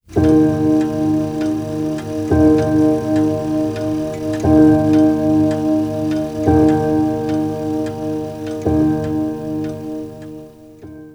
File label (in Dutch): staande klok tikken slaan